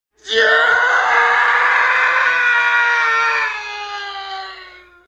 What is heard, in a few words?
Screams Man Gut-Wrenching Scream & Fall Into Distance; Human Sounds